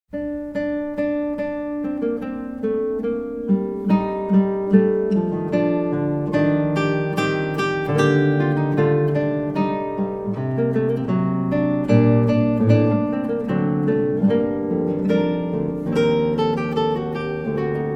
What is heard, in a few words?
fugue; Classical